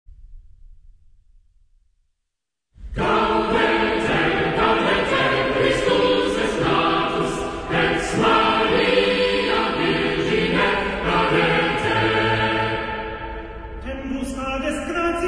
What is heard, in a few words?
Cathedral Choir, medieval melody; Gaudete!